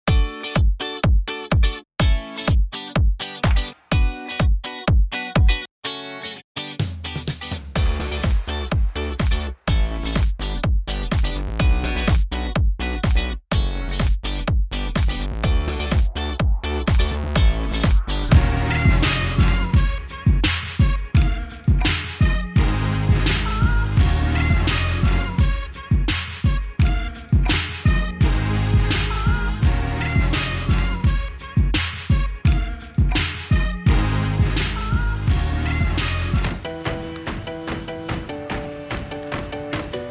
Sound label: Music; Unsung Hollywood; fall sizzle